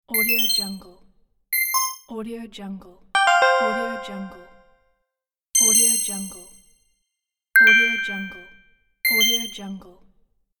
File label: notification